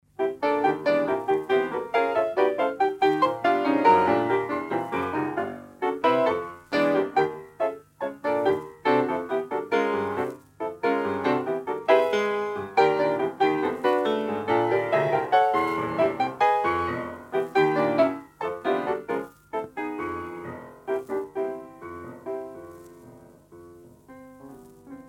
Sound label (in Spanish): Tres estudios para piano